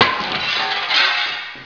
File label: GLASS